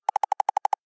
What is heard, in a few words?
Woodpecker; China Telecom